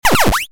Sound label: lever Push